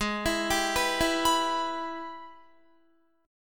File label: Ab Minor Major 7th Sharp 5th, down, Guitar; arpeggiated; AbmM7#5